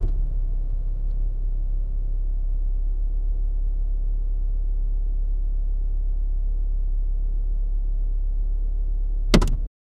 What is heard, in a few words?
create car interior sound, passenger